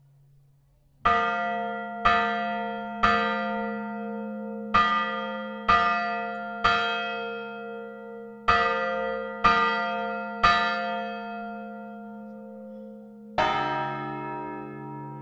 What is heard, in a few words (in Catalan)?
campana